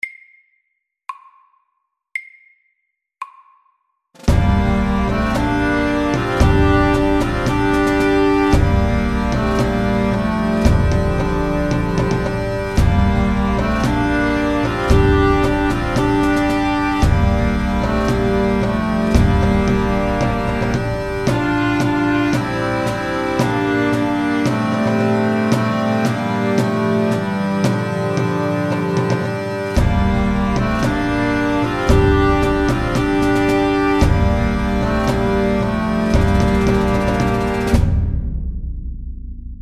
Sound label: Pipes and drums